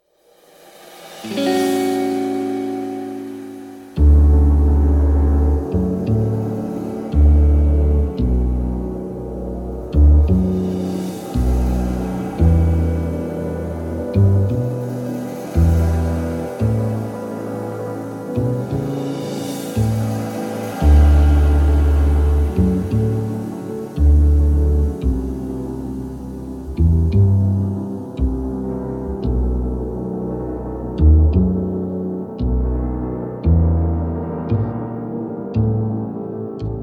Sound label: (D) no backing vocals